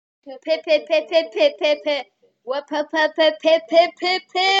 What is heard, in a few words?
pipipip